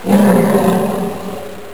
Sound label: Roar; Saban; Tigerzord